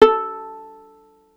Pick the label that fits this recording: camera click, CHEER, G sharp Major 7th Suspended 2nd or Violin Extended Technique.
Violin Extended Technique